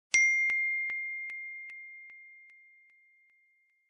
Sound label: iphone 13 pro max message tone iphone 13 pro max notification